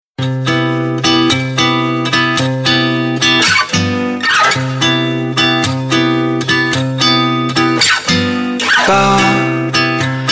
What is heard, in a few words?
Humming, phonetones ringtones; Optimus 2X SU660